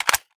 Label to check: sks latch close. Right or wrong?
Right